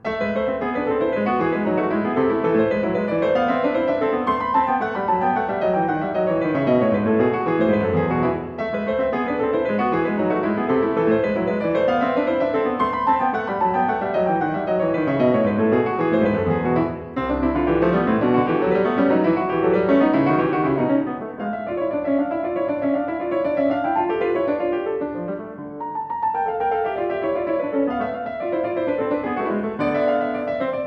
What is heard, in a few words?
Aria variata alla maniera italiana, in A Minor Variatio IX; Bach; Ashkenazy; BWV 989